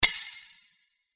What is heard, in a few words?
Sword Sound; FXHome; Fighting Sounds